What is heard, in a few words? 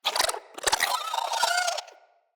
Squid Plant Attack Vocal; Traps